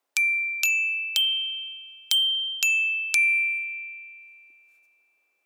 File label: Wooden Club